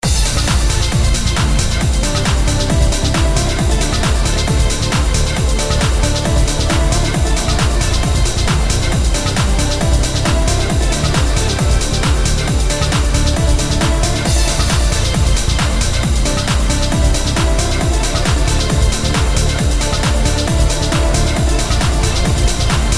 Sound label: Electronica Up Beat